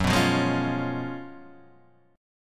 F sharp Major 7th Suspended 2nd Suspended 4th, Guitar, Standard; F#M7sus2sus4 strummed